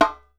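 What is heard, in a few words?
LARGE CERAMIC, DUNBEK; Voice Crystal - Steve Reid Definitive Percussion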